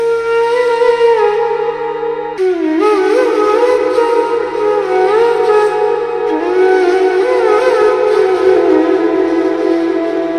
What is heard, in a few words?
flute, Malayalam; Electronica